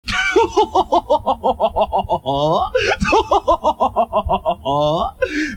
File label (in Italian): risata